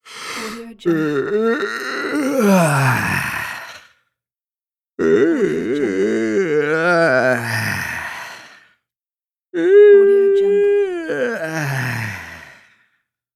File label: the male yawn